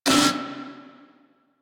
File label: Kubrow Amored Vocal Pain, Enemies; Warframe